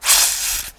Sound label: Death Snake